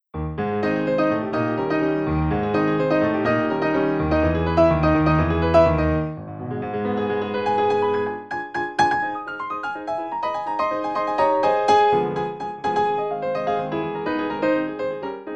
maple leaf rag

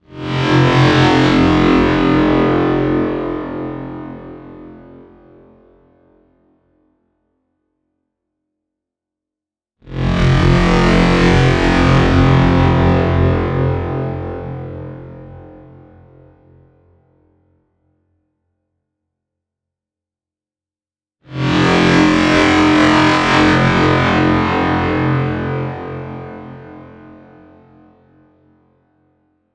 Sound Signature

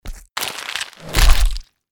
Grab Instant Kill; Gut Eater

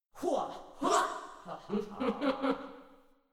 Princess Spawn VOX

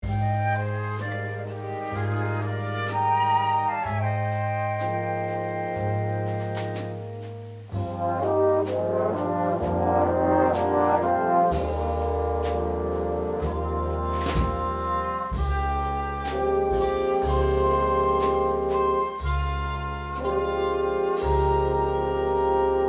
Ballad

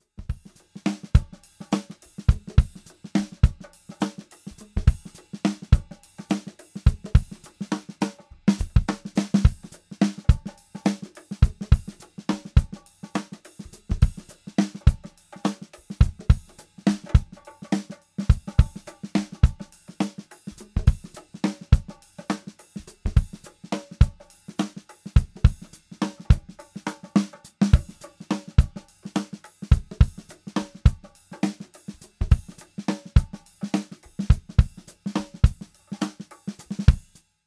Bongo Madness; Home Studio

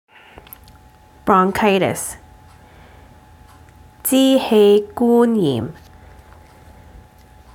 Bronchitis; Chinese Canadian Pharmacists' Association

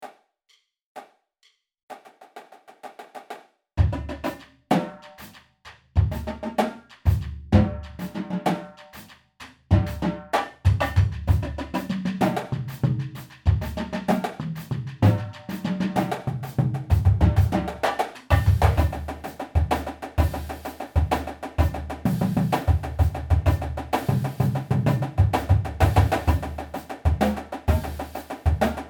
5 Tenors

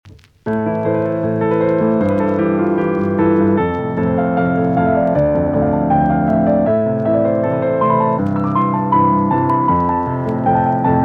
micropiano

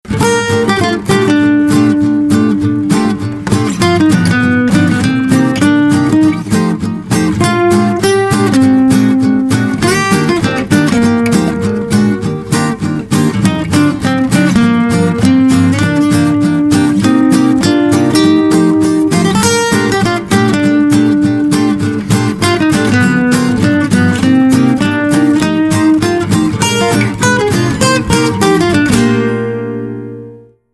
Old School Guitars

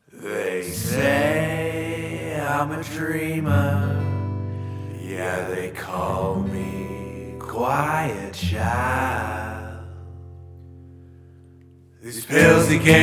choir child guitar; London; Smooth

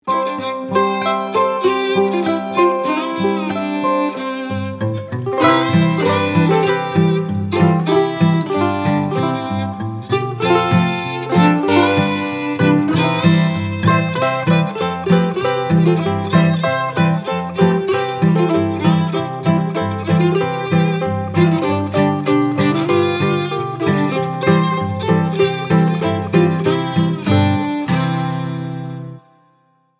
Joy To The World Bluegrass

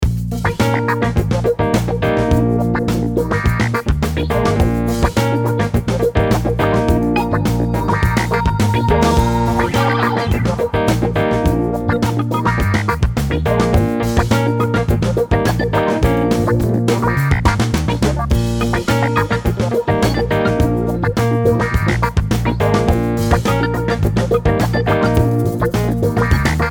Party Funk

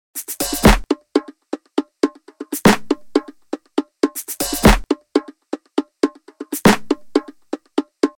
120 perc all things

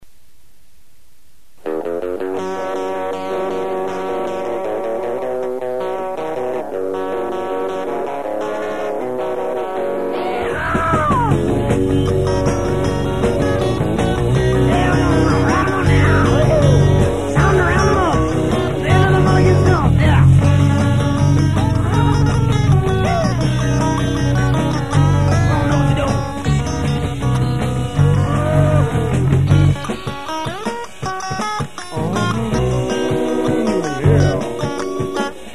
cowboy song

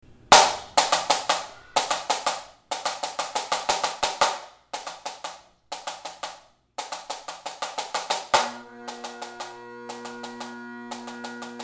Highland w Drum

